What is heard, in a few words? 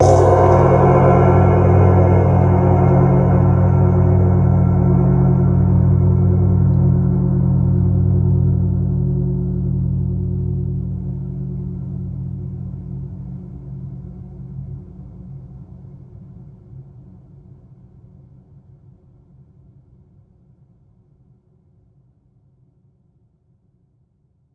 Gong Noise